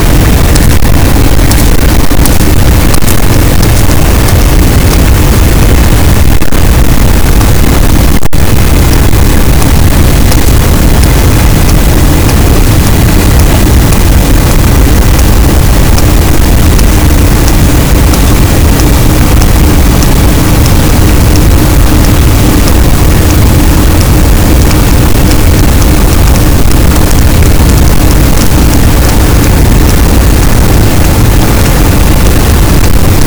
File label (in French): cachalot, Physeter Macrocephalus, gaussian noise